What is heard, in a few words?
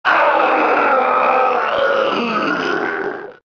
crashed scream; ermac